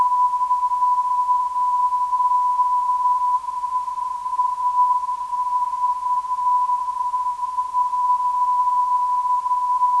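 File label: non-directional beacon; AV; 306.5kHz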